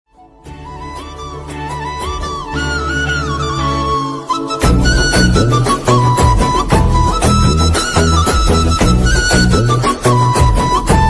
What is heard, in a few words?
love bgm ringtone south bgm ringtone tamil ringtone telugu ringtone; mahesh babu